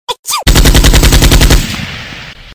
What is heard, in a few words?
Sneeze; Mk4